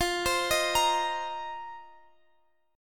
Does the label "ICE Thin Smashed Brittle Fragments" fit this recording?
No